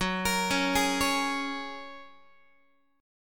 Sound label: Gb Major Add 9th, down, Guitar; arpeggiated; JGuitar